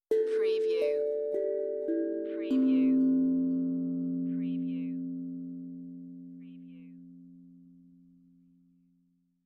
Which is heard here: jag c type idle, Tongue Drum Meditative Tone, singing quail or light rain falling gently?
Tongue Drum Meditative Tone